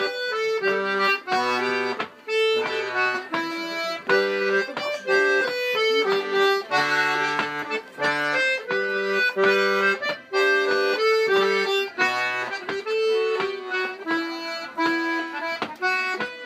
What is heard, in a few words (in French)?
Plinn Ton Double